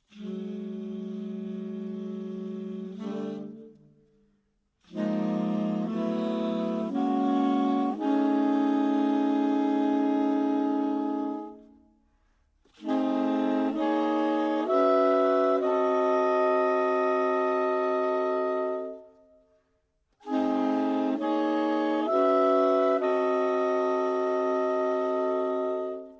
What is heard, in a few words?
Sax